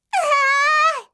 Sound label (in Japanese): uhaaa, yorokobu